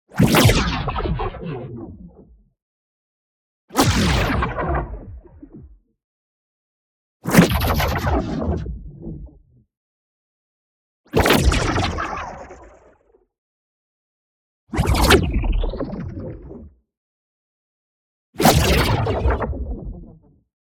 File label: ABILITY RELEASE Energy Unleashing; boom library; Matter